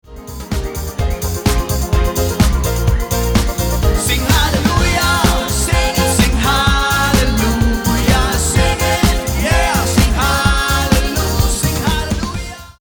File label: BEATPOLICE